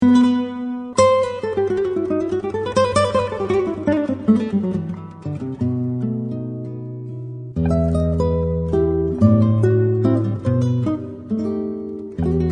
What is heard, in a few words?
flamenco